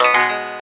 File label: gayageum, keytone